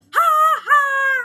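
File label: funny mocking ha ha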